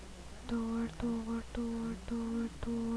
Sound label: door